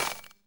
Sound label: breaking crate; Environment